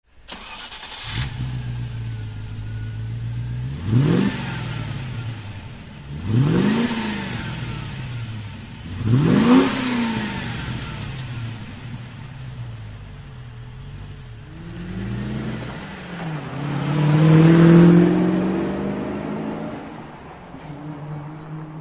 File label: magnaflow exhaust systems